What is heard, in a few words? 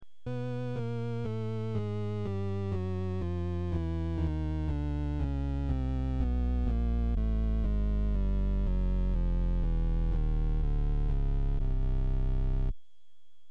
Bass And Treble, Rheem organ